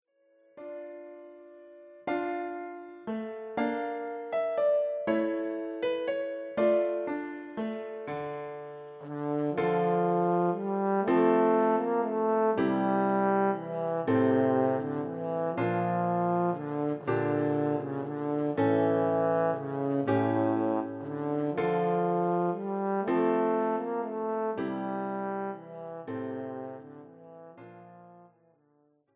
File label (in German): Greensleeves Posaune, für Posaune und Klavier; Schwierigkeitsgrad Klavier Level 4, mittelschwer, mit Vorspiel